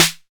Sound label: dirty snare drum sample e key